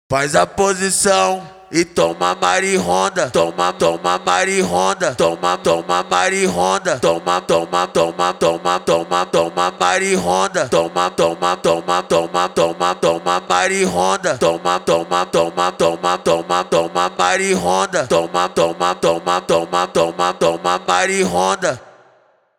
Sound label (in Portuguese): ACAPELLA